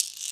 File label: SHAK; USB Soundscan vol.51; House Side Of 2 Step; AKAI; PERCS+FX